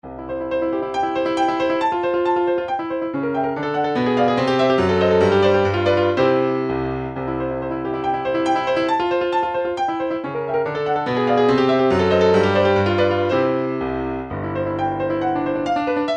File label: twinkle, midi; song book